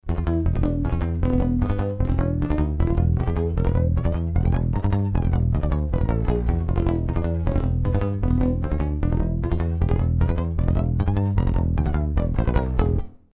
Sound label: Flange Buttom Studio; Charvel